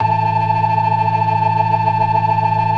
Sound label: FAST VIB, TREMELO+VIB, Synth and Organ